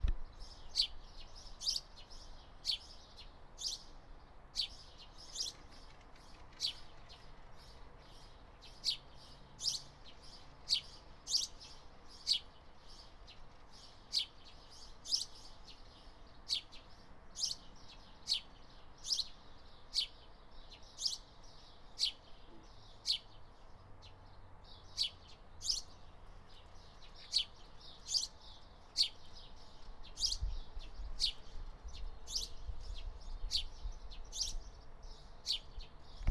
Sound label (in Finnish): varpuset